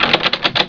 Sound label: latch locked, buttons; svencoop; sence; mistake coop